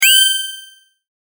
sword

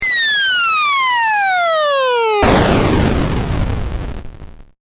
bomb

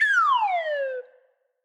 whistle DOWN

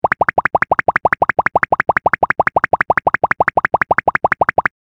anime walk

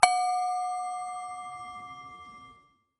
bell

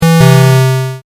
correct; ゆっくりのピンポンを太くしたverの高音ver; 正解の音・ピンポーン

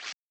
Rip Up Paper

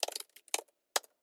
Keyboard

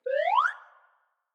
whistle UP

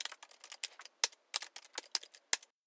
Keyboard

